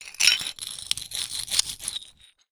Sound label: foley glass pieces broken small thick movement hard grind concrete; zapsplat